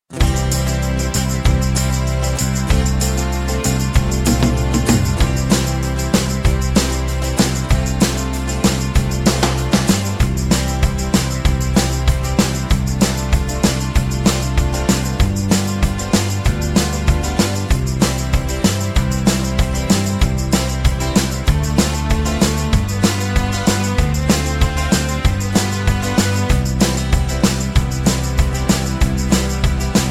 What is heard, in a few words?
C No Backing Vocals